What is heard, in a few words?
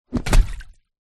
leisure rubber ring inflated drop into water